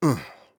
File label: Grunt Attack; paladins; BombKing